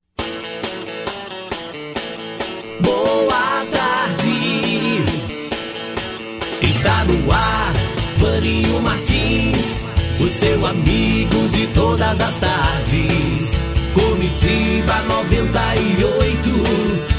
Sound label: jingle radio